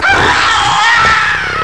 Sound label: terrified scream; halloween